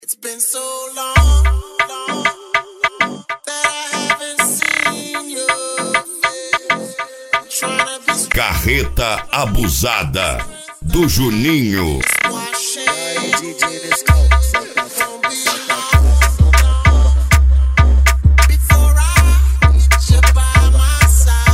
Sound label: mega bass